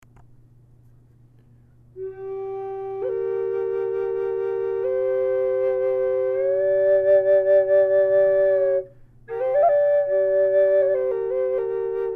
Mid G Drone Myrtle, flutes

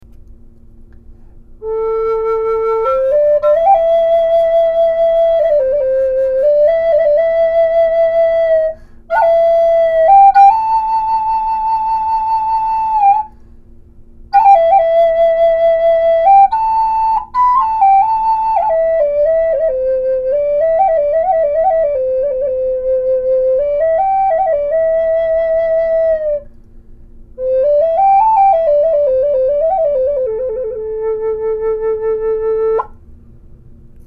Mid A Mah Inlay Strip, flutes

